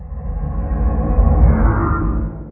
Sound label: elder idle, guardian; minecraft; mob